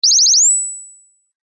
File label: wake up tones; oringz pack nine